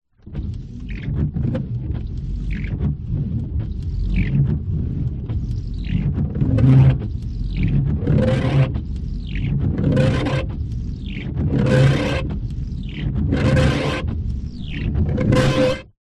car windscreen wipers wipe progressively drier squeaks internal; transport